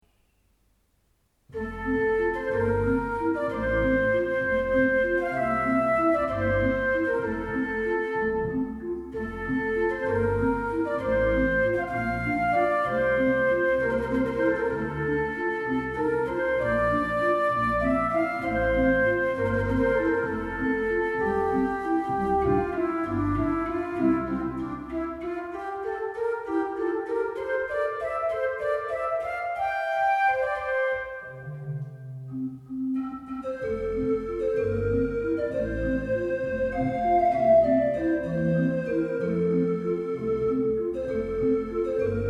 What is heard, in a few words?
Flute, organ